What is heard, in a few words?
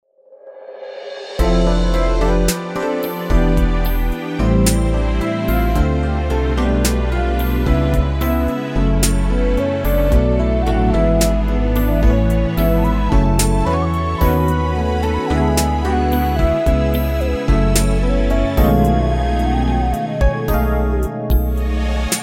rhodes; rhodes affair 2